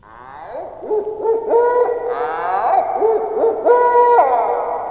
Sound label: ANIML; Zero G; Man Machine